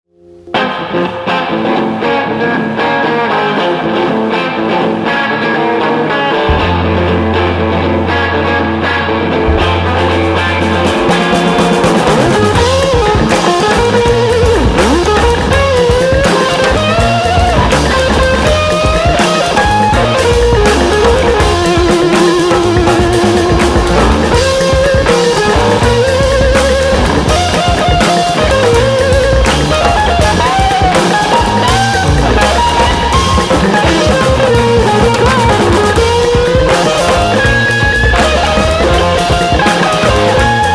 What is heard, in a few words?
guitar solo